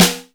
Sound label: SN, SNARES; USB Soundscan vol.20; Fresh Disco House I; AKAI